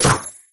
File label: freeze shards; Gotham City Speed